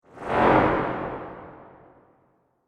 Whoosh Transition Sci Fi Materialize Resonant, Whoosh Transition Materialize Resonant Distortion; Sound Design; Whooshes